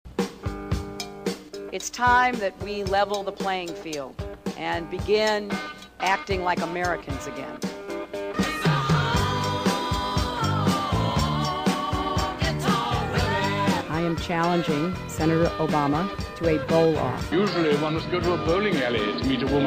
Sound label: Honky Tonk Bit w Cow Bell